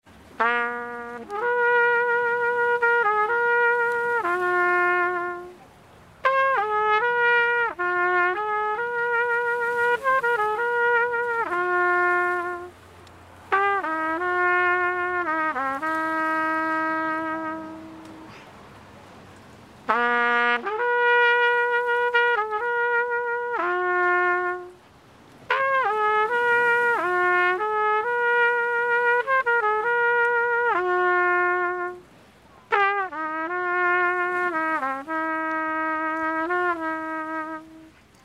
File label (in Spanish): PUERTOS HOMBRE TOCANDO TROMPETA EN MALECON